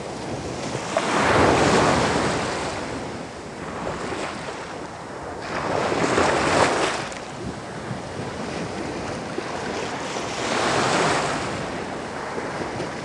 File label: Thunder Storms Rain Sounds; Sonidos de la Naturaleza; Binaural Beats Brain Waves Isochronic Tones